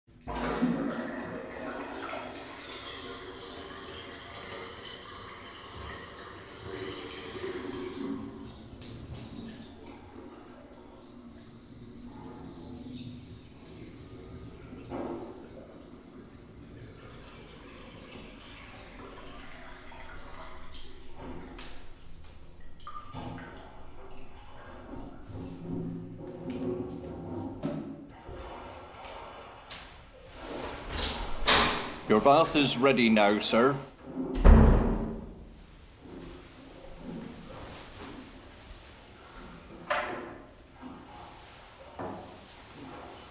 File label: Communal Baths Soundscape